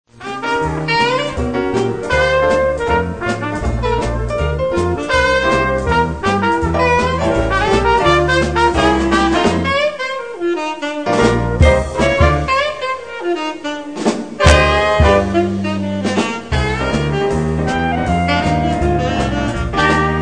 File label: dixieband